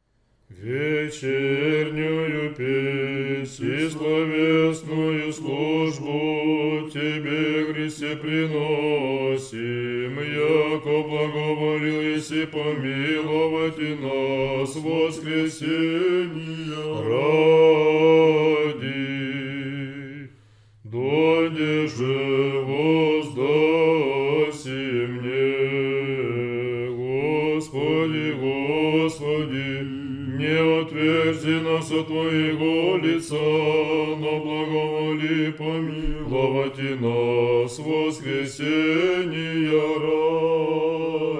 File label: tone 3; tone 8; sunday